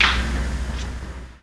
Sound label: Lightswitch impact